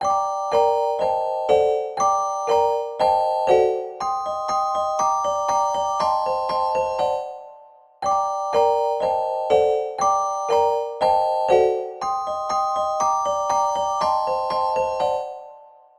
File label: Glockenspiel